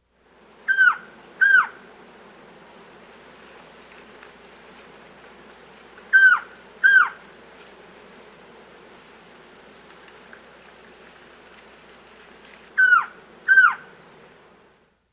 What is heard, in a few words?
Orange-Billed Trogon; S. America